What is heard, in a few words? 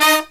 HIGH HIT